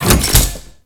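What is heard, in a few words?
Crane Move Horizontal End; Venus Open World; Objective Rooms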